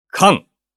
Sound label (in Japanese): カン; 場面切り替えや心理描写; 演出効果音